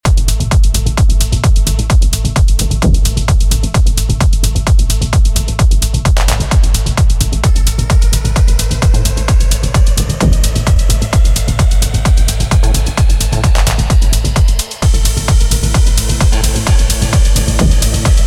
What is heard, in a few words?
Peak Time Techno